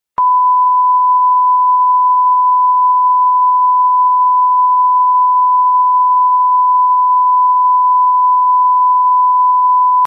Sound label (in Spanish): television rota